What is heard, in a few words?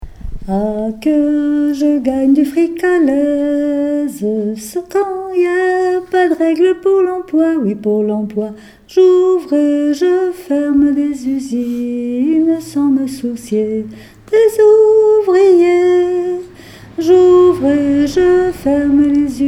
sopran